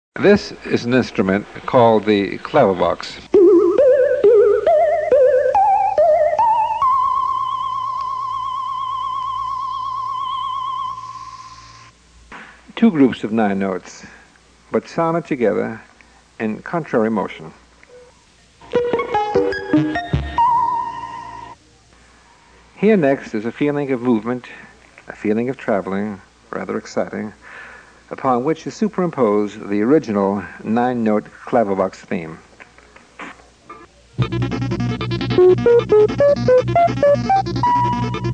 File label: Clavivox